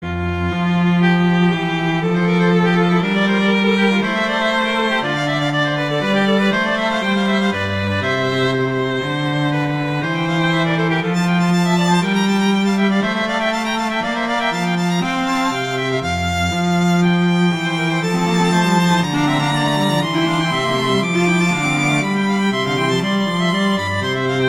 Allegro; Corelli

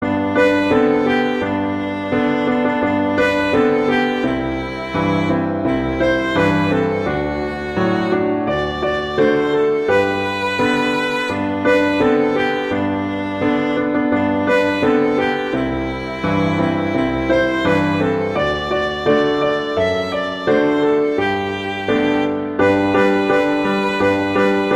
Jingle Bells, Christmas

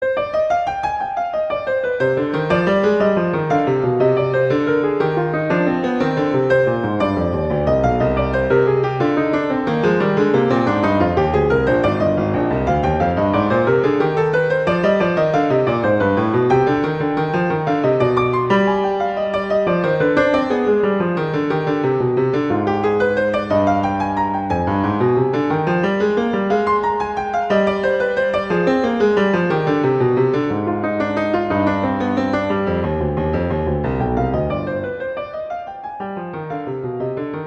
Exercise, C minor; Clementi; Preludes

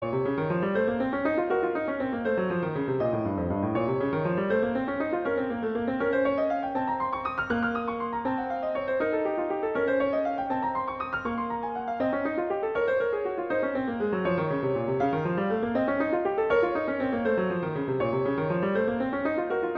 Exercise, Bb minor; Clementi; Preludes

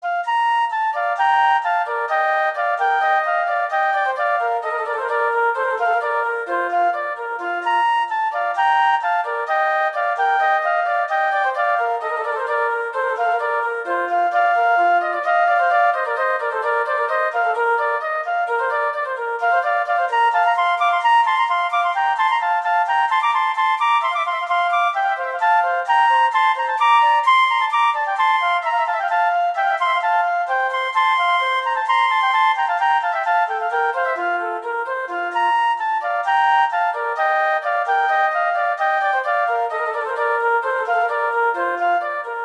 Allegro; Telemann; Canonic; Sonata 1

